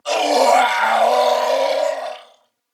Death Corpus Venus Male, en; Dialog; Barks; Battle; Warframe; CorpusVenusMaleB

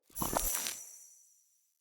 Shrine Puzzle Correct Unlock

